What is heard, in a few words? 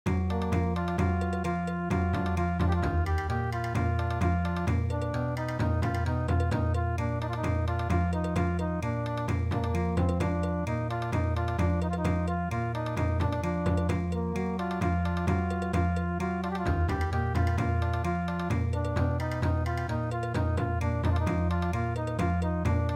Bon Odori